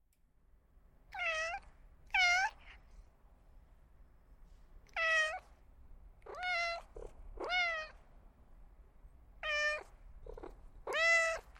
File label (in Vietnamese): tieng meo keu muon duoc chu y